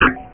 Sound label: Hit Mothership; Bedlam